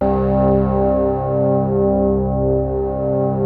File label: PPG C2; Hollywood Edge - Giorgio Moroder Rare Synthesizer Collection; PPG.2.3